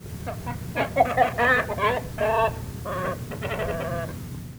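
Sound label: beniiro flamingo, ベニイロフラミンゴ 鳴き声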